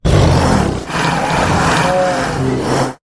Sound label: Creature Attacks